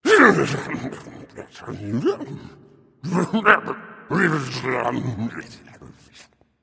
grumble; Smite; bakasura

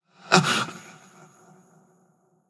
surprised; smite; voicepack; hachiman

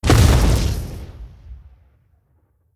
ground impact; smite; god arthur

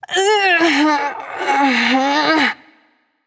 grunt lift; smite; mulan

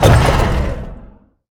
chariot land; smite; Apollo

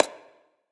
clap; smite; osiris

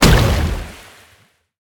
basic hit; Smite; Sol

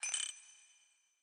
movement run; smite; vamana

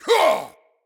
attack grunt; smite; god odin

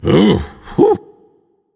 nv sigh; smite; zhongkui

